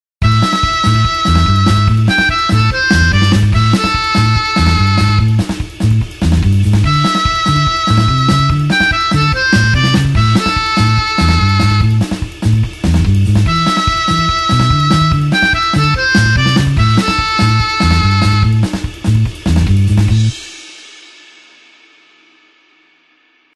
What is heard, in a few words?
blues harp 8beat A tempo